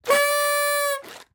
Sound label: party horn; twitch